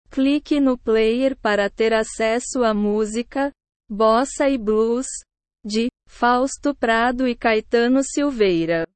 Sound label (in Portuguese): bossa e blues